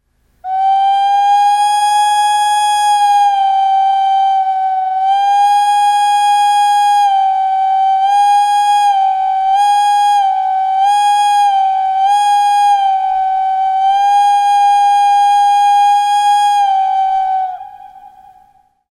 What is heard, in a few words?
ocarina